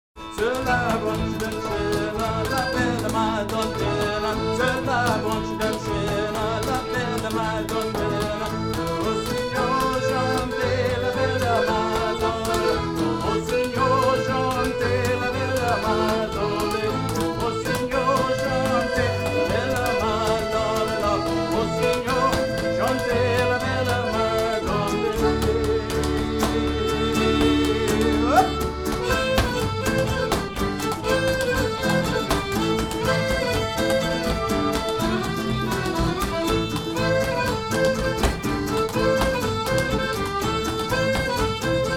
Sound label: french canadian quintet